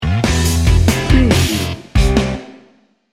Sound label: enthusiastic pop rock sting bumper